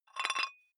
dumbbell thread take off small weight soft, fitnessstudio; sport freizeit